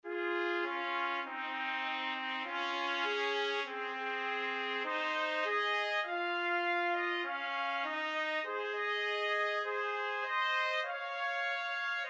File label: mountain thyme trumpet duet